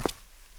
concrete; blindside

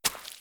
boots mud; Apotheorasis

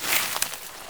grass step, footsteps

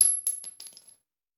Bullet Shell; Sound Ideas; Guns

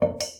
water dripping; Apotheorasis

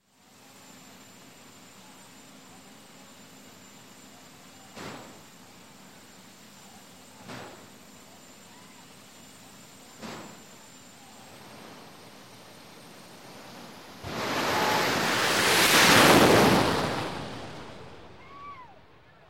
Dragster Race By; Sound Ideas; Series 2000

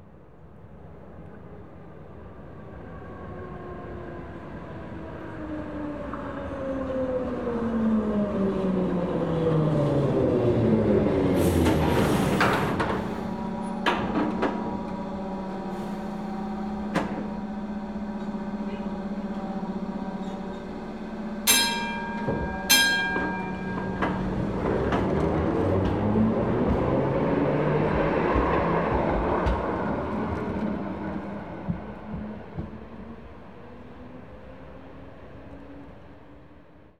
tram arrives departs; best service Blue Box

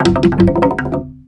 Bone Tower Percussion Rattle; SFX Kit; Foley - Percussion

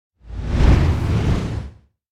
Whoosh Big Swift By Giant Strokes - Long; Hollywood Edge; sound designer's toolkit v2

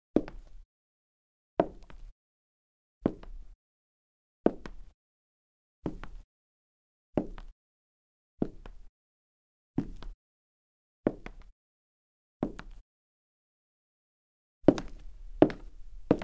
Wood Hard, Foley Footsteps; Sound Ideas

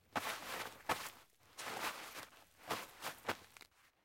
Tunnel Crawl

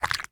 HORROR FLESH SQUISH; blindside

